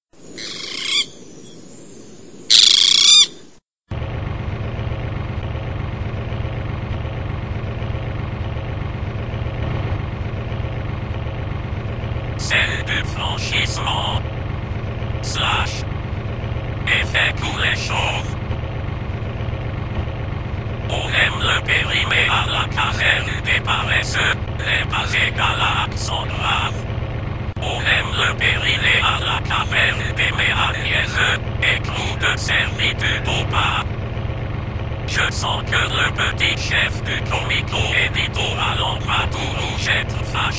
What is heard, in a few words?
engine boat